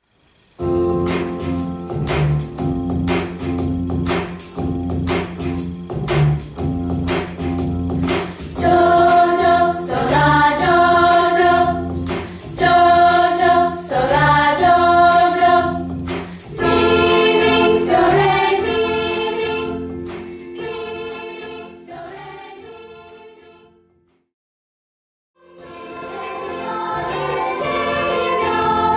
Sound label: pentatonic patchwork